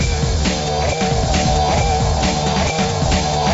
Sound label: loop